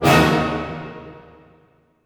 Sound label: D# MIN FF, orchestra hits; Sound On Sound - Peter Siedleczek's Orchestra